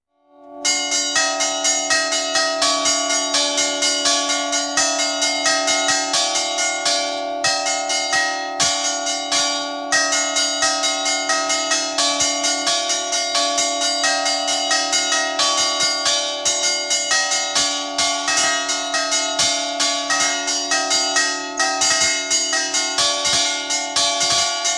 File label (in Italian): campane